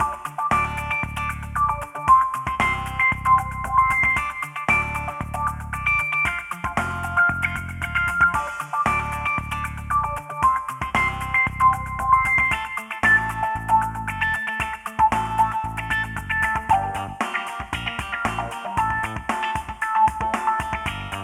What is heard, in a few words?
Funky Streets, LoFi, urban city music